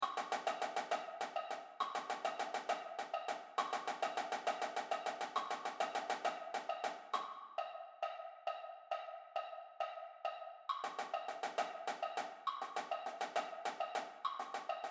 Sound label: Battery Triplet Timing Exercise, Percussion; Racine Scouts 2017